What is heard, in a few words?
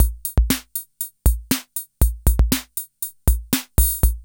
ROCK; INIS - Funky Xtreams; KR55 LP4